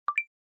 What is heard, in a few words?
battery charge; Coolpad ESurfing-No1-2021